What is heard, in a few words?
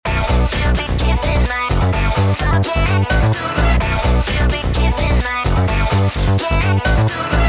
stereo original